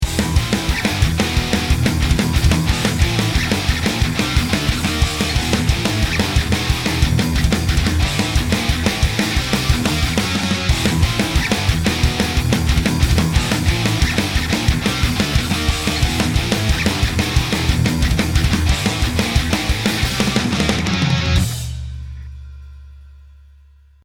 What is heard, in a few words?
Thrash Metal WET; StripV3